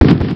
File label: bomb smoke; Indiara And The Skull Gold